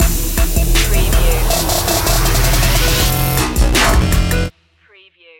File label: anomaly stinger